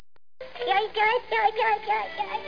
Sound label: yipe